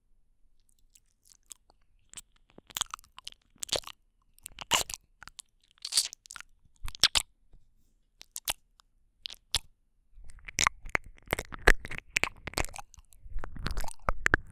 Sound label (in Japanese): キスしながら舐め舐め; 夏の終わりにドキドキ耳舐め【安眠ASMR】